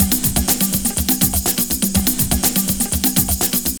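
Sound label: drum loops, right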